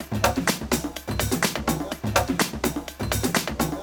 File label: techno drum top loop voices; drum loops